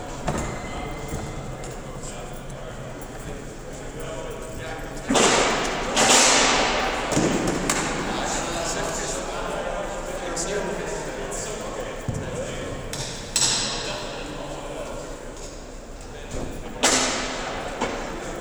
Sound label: foley